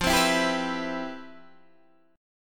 C Major 7th, F, guitar chord; Cmaj7/F; strummed

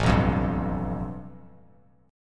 A 7th Suspended 2nd Sharp 5th A Bass Standard; A7sus2#5 strummed; JGuitar

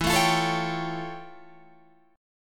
F Augmented Major 9th E

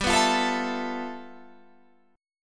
G 13th G, chord

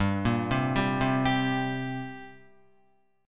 G Minor Double Flat 5th, Guitar; arpeggiated

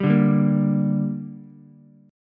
D Suspended 4th Sharp 5th D down Ukulele; strummed; Dsus4#5 chord

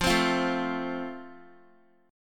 F Major, Guitar; strummed; x,8,10,10,9,x

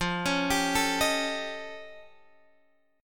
F Augmented 9th F Guitar Open G

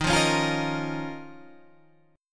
D sharp 7th Suspended 2nd

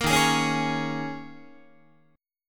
Eb 7th Suspended 4th Guitar, Eb7sus4 chord; strummed; JGuitar

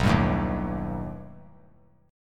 B Augmented 7th B down; strummed; JGuitar

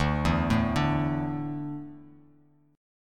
D Suspended 2nd D down Bass Standard; arpeggiated

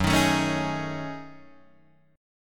Gb Minor 6th Add 9th Gb down Guitar Open D

